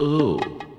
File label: Ooh; TheChaseBuzzer